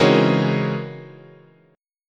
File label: C sharp Minor Major 13th, guitar chord